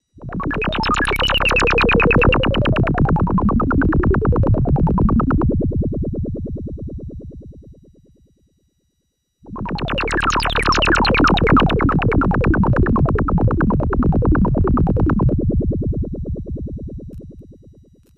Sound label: modular